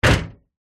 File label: impact glass loose panel